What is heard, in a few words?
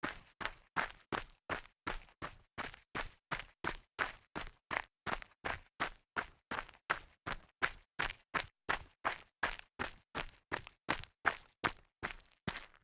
sneaker jog light grit